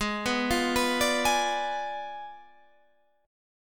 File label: Ab Diminished 7th Ab Guitar Standard; arpeggiated; 16,14,15,16,15,16